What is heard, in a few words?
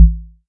bump soft; pinball; tux